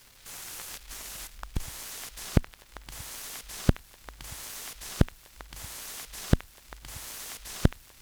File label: vinyl crackle; 99Sounds; Vinyl Noise SFX